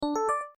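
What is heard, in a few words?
iPhone alert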